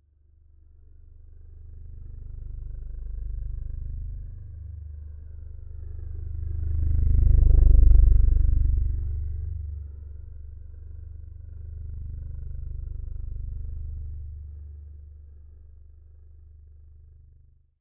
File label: LOW PLANE; AKAI Anime Sound FX; NATURE